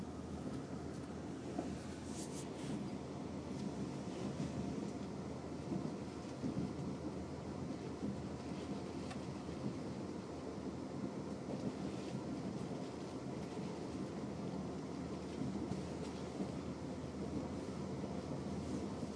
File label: train from Waterloo to Alton very quiet for a full carriage sound of